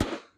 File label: pinata hit